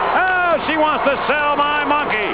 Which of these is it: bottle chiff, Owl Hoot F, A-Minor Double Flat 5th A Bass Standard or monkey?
monkey